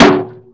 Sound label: bullet hit tin; weapons; KINGPIN